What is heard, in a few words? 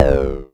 Klone Dual Percussion Synthesiser tom